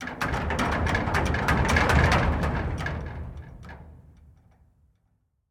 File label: Metal Movement Metal Rattle; Mono; Justsoundeffects; Metal Movements